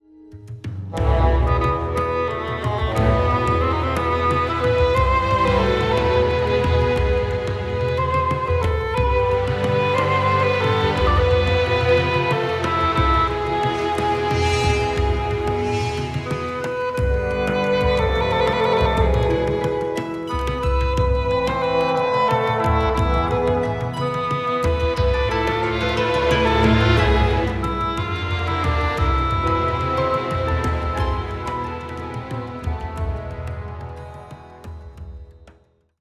wind solo; Virtual Orchestration

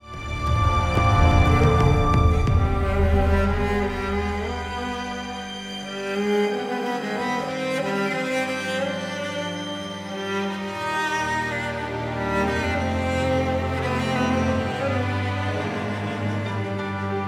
cello solo; Virtual Orchestration

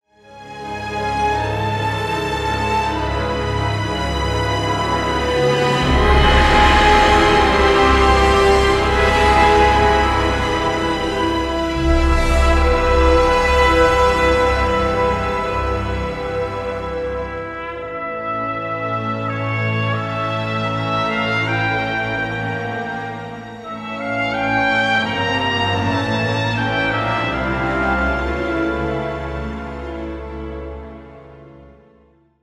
brass solos; Virtual Orchestration

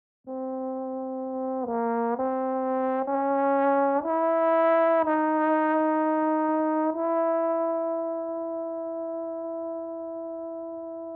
Reverb, trombone solo DRY; Virtual Orchestration

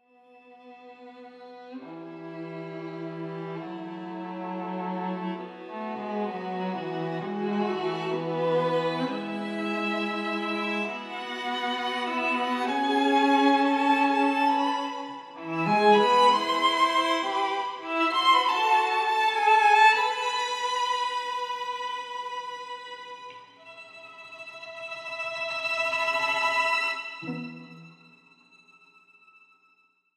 live strings; Virtual Orchestration

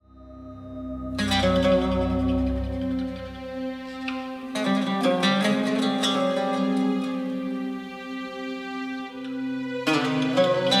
oud solo; Virtual Orchestration

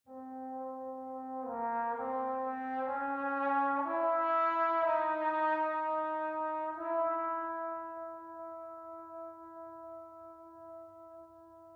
Reverb, trombone solo FAR; Virtual Orchestration

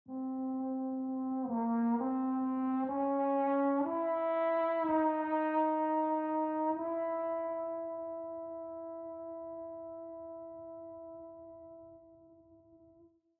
Reverb, trombone solo; Virtual Orchestration

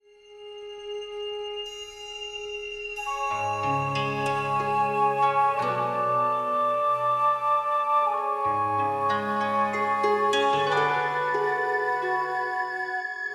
samples, winds; Virtual Orchestration

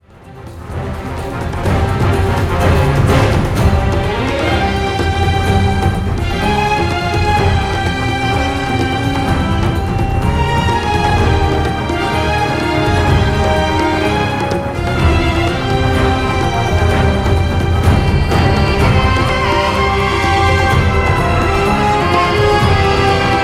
samples, strings; Virtual Orchestration

